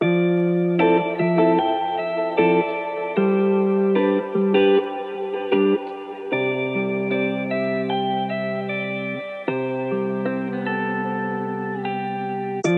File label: chill